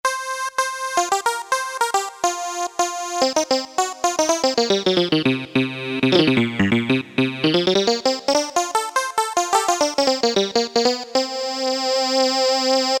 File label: SYNTH LEAD, Casio Rapman; Tones